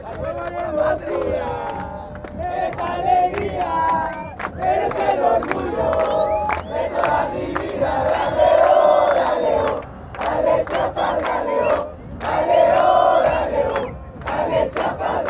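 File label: fanchants, football chants; dale chiapas dale oh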